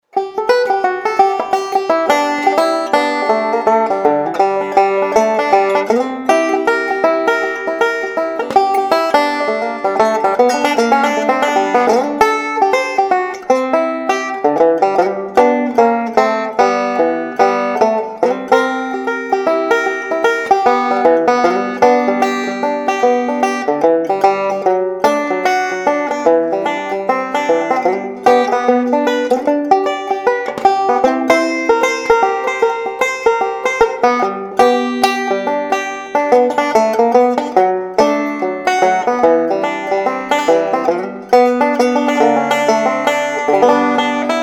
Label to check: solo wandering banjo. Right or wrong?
Right